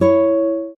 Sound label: Classic Guitar, notifications